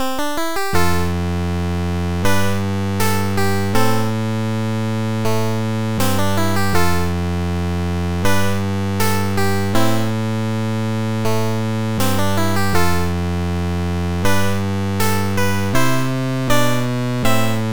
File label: 8bit Snow In Silence; 8bit フリーBGM; 雪×和風がテーマのしっとりした曲; レトロゲーム風の和な感じの曲です。「雪×和」をテーマに作曲しました。箏と尺八の音を、ピコピコ音で表現しています。; ツクール用